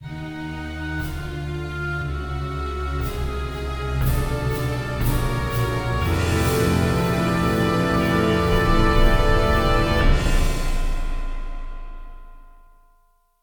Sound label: defeat, music; wesnoth